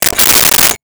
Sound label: Rotary Telephone Dial